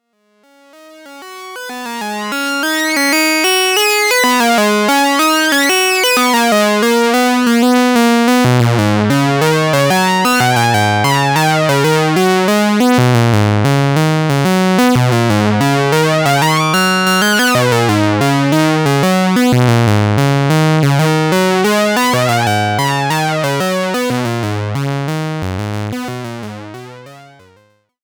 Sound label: prodigy sync